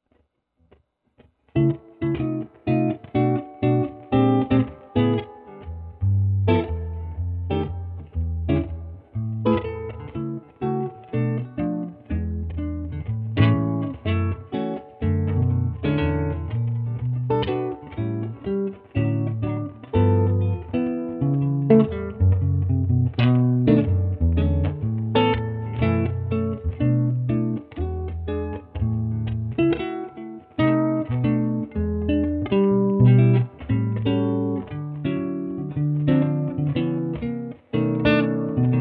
Improvisation Jazz Standard Type, Solo Guitar Improv; Ted Greene